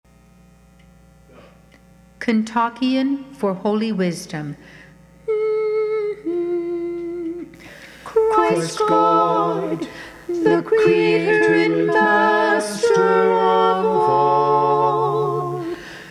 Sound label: kontakion mid pentecost SAB, choir